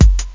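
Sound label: bass drum; kicks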